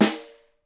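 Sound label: SNARE, Percussion; Miroslav Vitous - Percussion & Harp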